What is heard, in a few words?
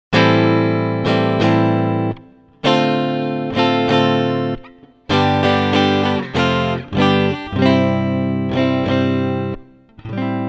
Chart Reading Example, electric guitar; electric guitar performance diploma